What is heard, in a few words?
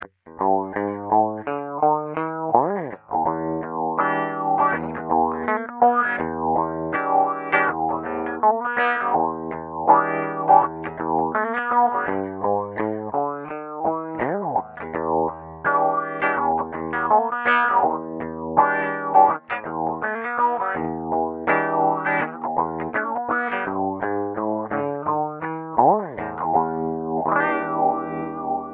Wah Dunlop